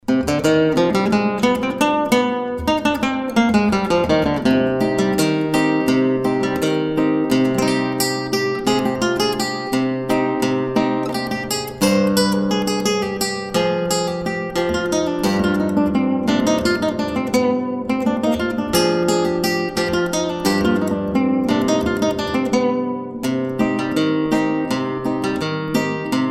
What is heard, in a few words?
Guitar